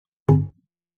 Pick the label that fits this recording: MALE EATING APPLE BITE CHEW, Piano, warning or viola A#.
warning